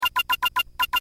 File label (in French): clavier